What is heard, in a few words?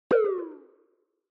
Onoma Sigh Reverb